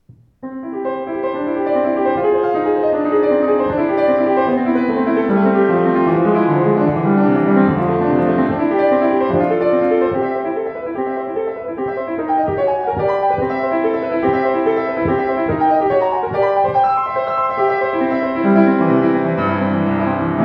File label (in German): Sauter Pure Basic Debussy, klavier